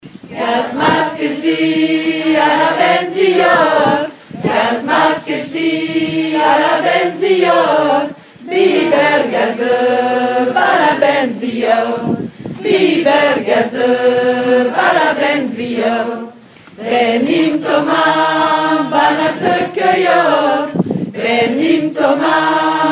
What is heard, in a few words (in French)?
lead couplet; voix principale couplet (le 1er couplet se chante à l'unisson)